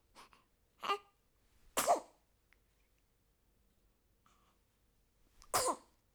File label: sneeze